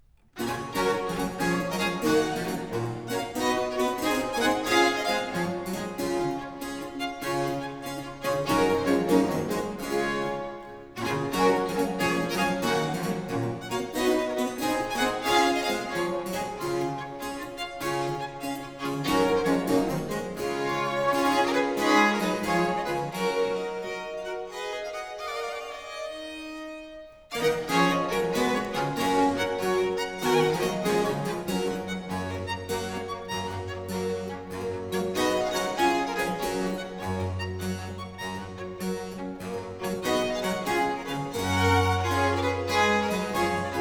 Trio Sonata Gigue (Presto), 2 Violins and Continuo; HANDEL; Trio Sonata for 2 Violins and Continuo in G